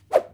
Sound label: whip